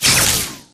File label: imp bullet lightballistic shieldwall sizzle